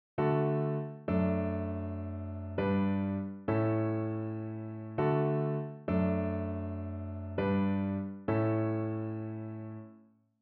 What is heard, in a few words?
chords